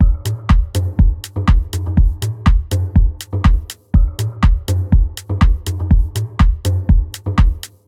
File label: house - techno beat passage 122bpm - fm - 122; drum loops